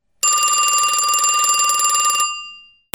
PHONES

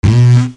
CARTOON

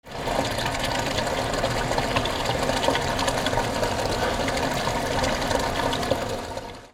INDUSTRY

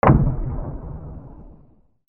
WEAPONS

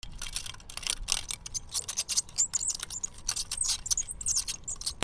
BOATS

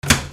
KITCHEN

